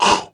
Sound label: voice sd, Yamaha TG-500